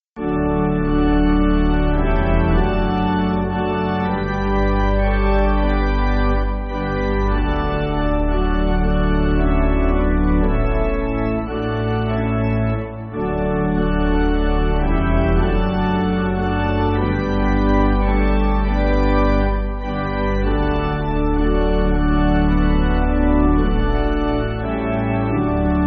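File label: Pipe, Pipe Organ, Backing Files for Churches; Sweet hour of prayer! sweet hour of prayer!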